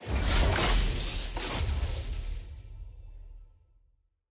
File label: loot reveal shard rarity ultimate